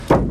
Bricksfall; slots; Kungfu Showdown

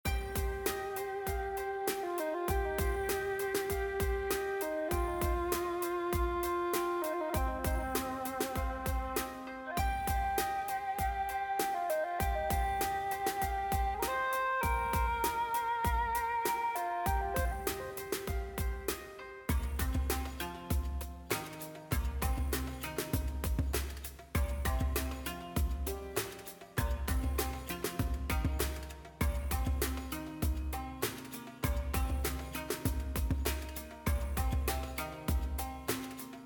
BGM Main UI; slots; Panda Majesty2

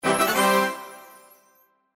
Summary Freespin Notification; slots; Sharks Vs Dolphins

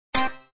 Spin Stop Win; slots; Paradise Escape

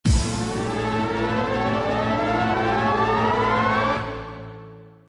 SFX Anticipation, SLOTS; Pinata Loco

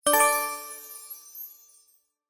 reel stop win, slots; Fruit Fiesta

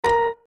Double Up Button; SLOTS; Double Up Mode; Crazy Rockets

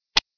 chip, Roulette

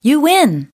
You Win

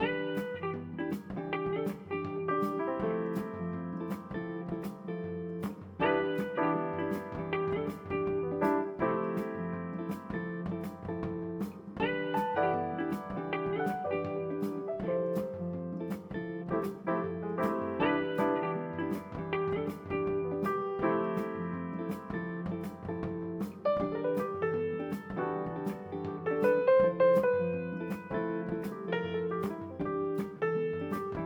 BG MUSIC AMERICAN

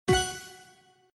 Spin Stop Wild Win; slots; Realm Saga

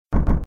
Stone Fall; slots; Aztec Fate

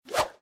BONUS SWISH; Panda Majesty

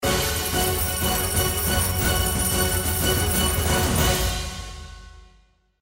Feature Win; slots; Pirate Quest

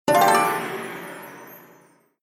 Reveal Win; Wealth Gods2

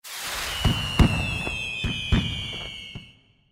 Grand Jackpot Symbol Wins; slots; Miles And Millions

